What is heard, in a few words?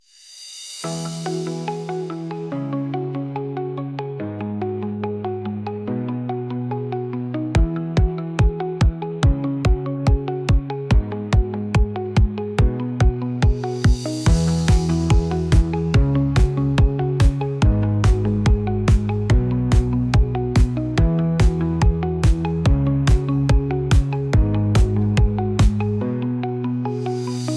Synth Sizzle